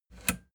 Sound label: plastic button latch push enable